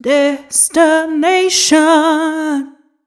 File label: f mel e flat, fem Vocals